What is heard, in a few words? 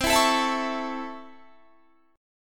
A Major C; A/C strummed